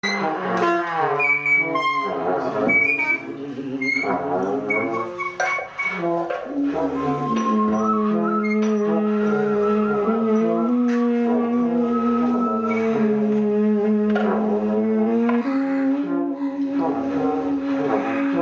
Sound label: belgium nice tune ringtone; louvainla